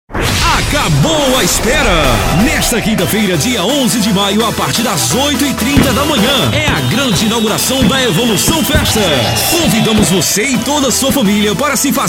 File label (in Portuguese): VINHETA FESTA; EVOLUCAO